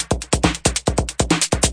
138 bpm echo middle hit, drum loops and breaks

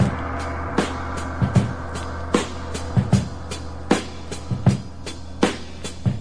077 big gong, drum loops and breaks; 77 bpm; Isis

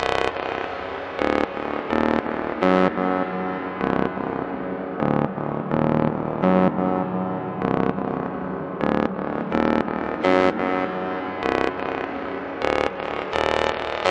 126 gnarly ominous filter bass distant; SYNTH AND ELECTRONIC LOOPS

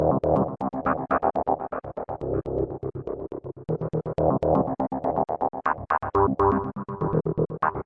122 dark stereo synth arpeggio; SYNTH AND ELECTRONIC LOOPS

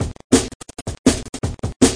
167 mash, drum loop; 161 to 180 bpm

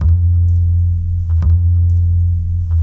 085 deep acoustic bass, bass loops

